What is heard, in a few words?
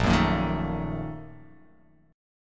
A sharp Major 7th Suspended 4th Sharp 5th